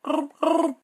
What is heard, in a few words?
bulb bulb frog; SyrinScape; Syrinscape unplugged; Jungleplanet dinolaser battle